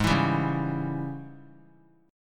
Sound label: G sharp Major 7th Suspended 4th Sharp 5th; 20,23,22,23; JGuitar